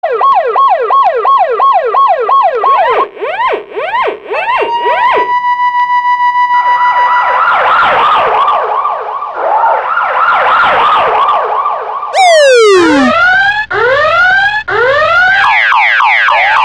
Sirenes Policiais, toque de celular

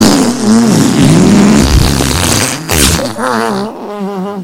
Alarme de Peido; toque de celular

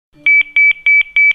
Nextel Alerta Notificacao; toque de celular

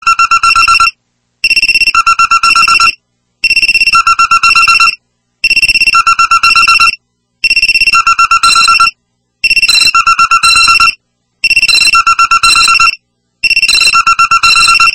Despertar alarme; toque de celular

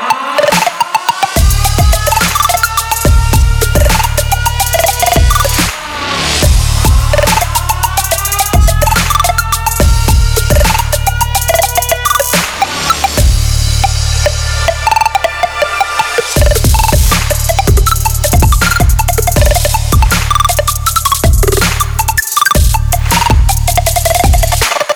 Alarme Trap; toque de celular

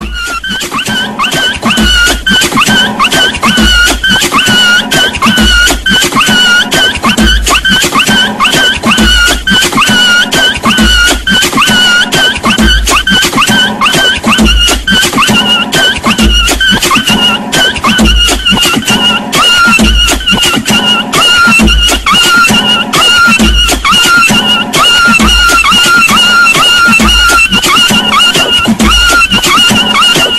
Funk do Cachorro Chorando; toque de celular

